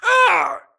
grunt death quick; Titanfall 2

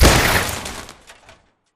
Explo Damage State Light; AI Reaper; Titanfall 2

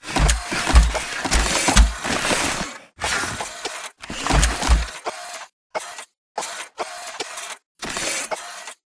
walk to hatch and open; Titanfall 2

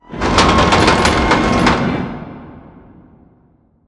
Prop Warehouse Prop Rack Rotate; Titanfall 2; Boomtown

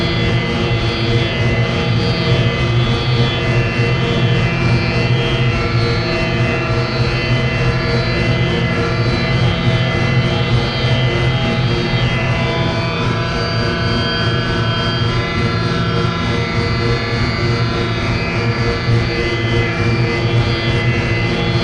Robot Arm Track Med Close; Boomtown; Titanfall 2

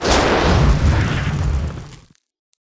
atlas dash close; Titanfall 2

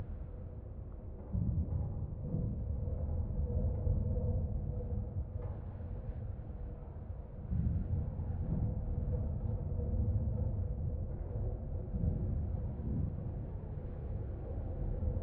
int closed room; Titanfall 2

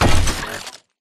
footstep shuffle; Titanfall 2

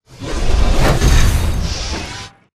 melee stomp; Titanfall 2

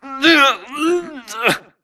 grunt Struggle; Titanfall 2

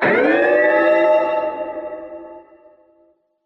generator under attack alarm dist; coop; Titanfall 2

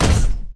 Footstep Jog Close; Titanfall 2

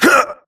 grunt hits; Titanfall 2

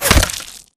bullet generic head kill shot human; Titanfall 2

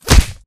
bullet light ballistic flesh; Titanfall 2

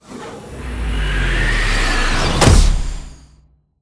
embark casual right end; Titanfall 2

